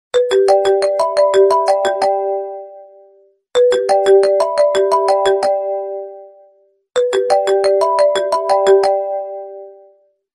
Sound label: new apple ringtone